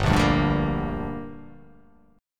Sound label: G Minor G down, chord